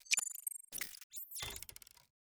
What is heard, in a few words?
map unfold